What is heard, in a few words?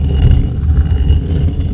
AMB STON